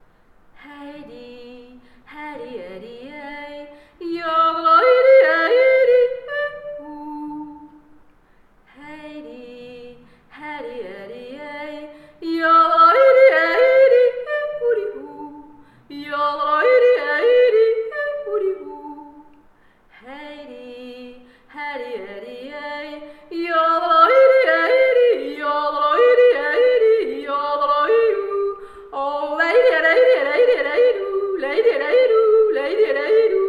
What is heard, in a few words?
Jodel